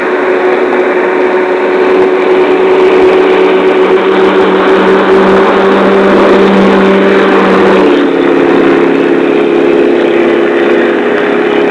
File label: HIGH SPD, Aerei; trasporti